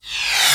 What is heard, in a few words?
VFX Short Fills & Reverse